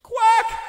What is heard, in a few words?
Quack; Krillin